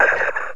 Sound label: firing drone single